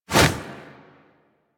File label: Edge Fist Swipe; Warframe; Pacifist